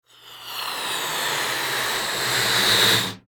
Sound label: inflation balloon animation sound